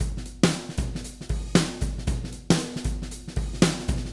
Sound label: ezdrummer plus overhead; toontrack testbericht